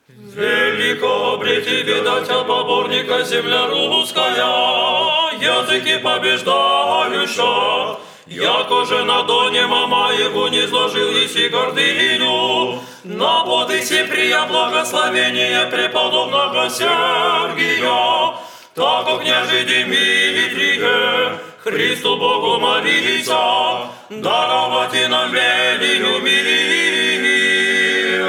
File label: tropar